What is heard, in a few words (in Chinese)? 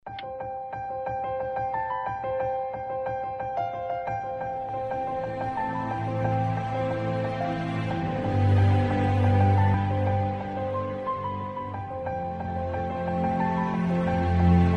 音乐大气, 背景音乐